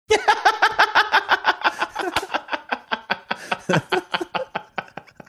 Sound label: having a good laugh